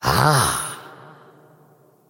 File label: wolf attack emote; dota; crystalmaiden